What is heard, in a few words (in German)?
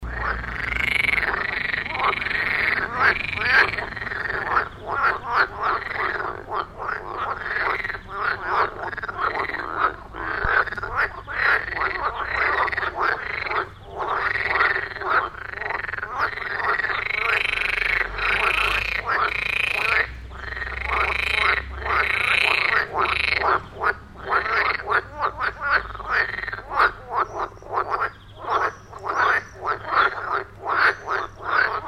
Froesche